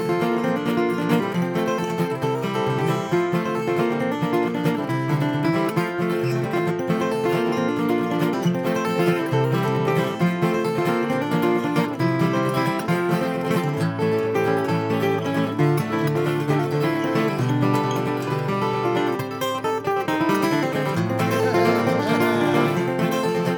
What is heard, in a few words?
Guitar